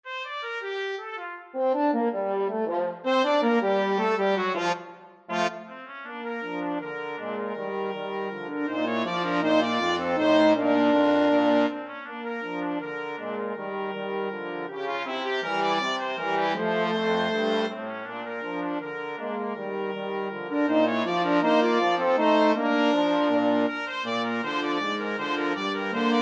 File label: brass ensemble